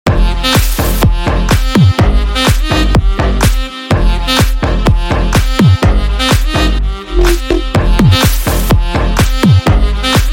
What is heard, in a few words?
sax